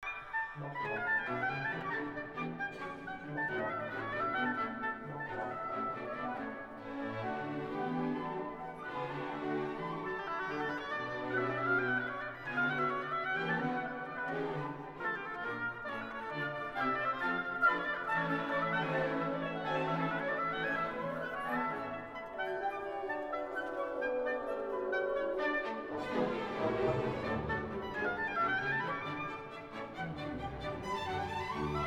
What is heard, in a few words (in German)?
Oboenkonzert Vivace Anfang; Strauss